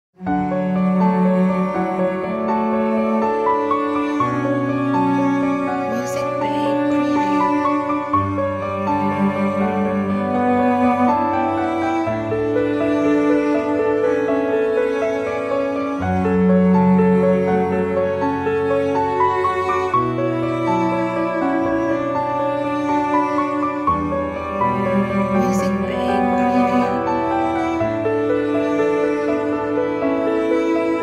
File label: Romantic Cinematic Piano and Cello